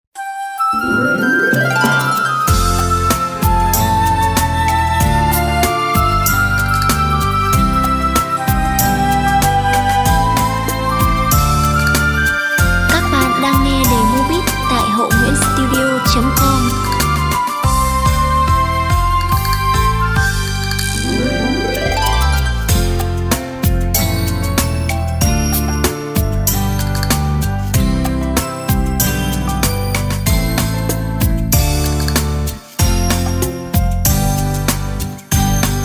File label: beat, playback